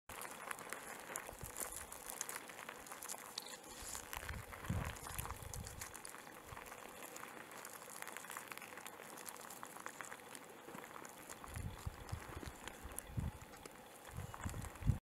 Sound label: Pangolins; پانگولین